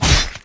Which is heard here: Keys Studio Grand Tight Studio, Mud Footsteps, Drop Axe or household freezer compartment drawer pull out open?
Drop Axe